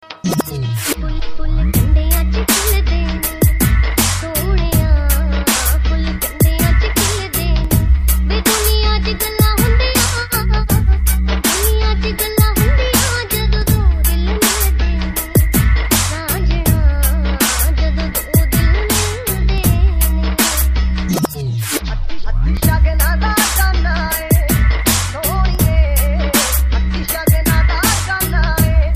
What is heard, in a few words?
remix punjabi ringtone